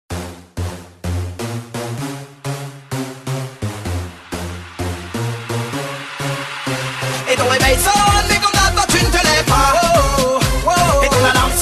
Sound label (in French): rasta reveil